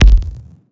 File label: Lat, Kik, One Shots; Sonic Foundry (Sony Creative Software) - Future Retro Dance Excursions